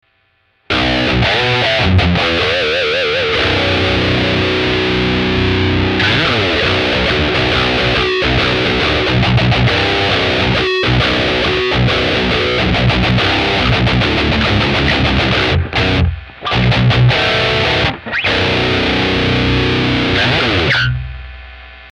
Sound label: tone 7str